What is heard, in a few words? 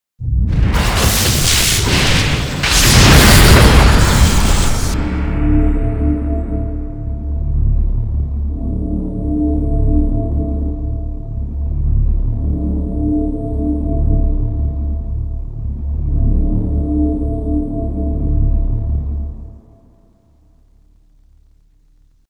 magic